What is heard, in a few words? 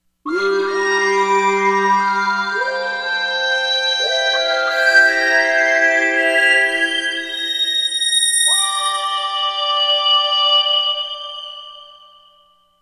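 ethno strings, Korg; Korg MSC-13S Ethnic 2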